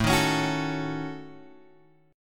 A 6th Flat 5th, guitar; A6b5 Chord {5 6 X 6 7 5} strummed

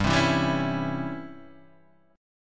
Db Minor Major 7th Flat 5th G

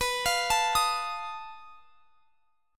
B 7th Flat 5th B, chord; B7b5; arpeggiated

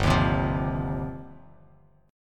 G 7th Suspended 4th Sharp 5th, Bass Standard; G7sus4#5 strummed

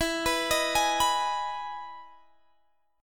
E Major 7th E, Guitar, Drop D; chord shape

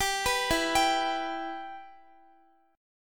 E Minor E down Guitar; arpeggiated

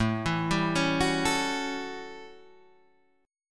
A 13th A down Guitar Half Step Down

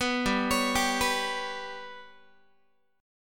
G Major Flat 5th Guitar, chord; GMb5; arpeggiated

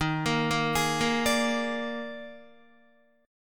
Eb Major 7th Suspended 4th, Guitar; arpeggiated; chordshape; JGuitar

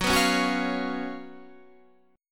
F sharp Major 7th Suspended 4th, Guitar Standard; F#M7sus4 strummed

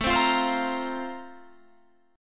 F Suspended 4th A; Fsus/A; strummed

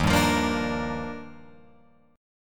Eb Major 9th, Guitar Open F; EbM9 strummed; 3,2,5,5,5,5; JGuitar

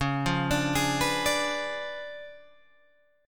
Db 7th Flat 9th Db, down, Guitar Open G

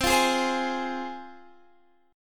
F Minor C

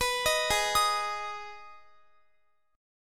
G sharp Minor G sharp down Guitar, chord; G#m arpeggiated

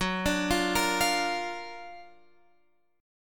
F sharp Augmented Major 7th, down

